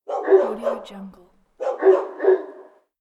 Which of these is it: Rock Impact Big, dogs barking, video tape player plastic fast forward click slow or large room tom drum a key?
dogs barking